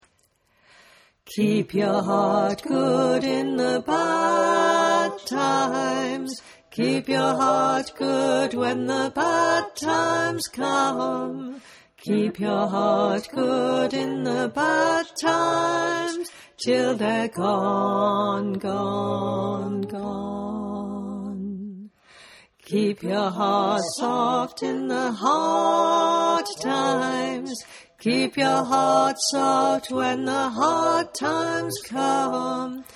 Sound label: tenor